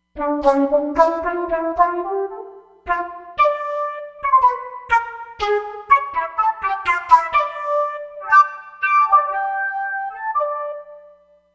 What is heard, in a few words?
Chiffed Toot, Roland Fantom XR